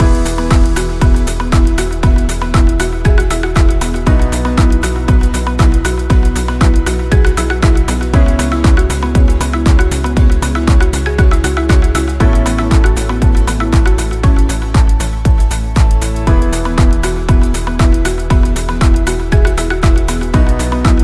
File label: Corporate Loop Positive; service business